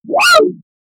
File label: jump of the demon, demon